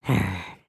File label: Idle Bobbing; creatures organic; Lego; Strombie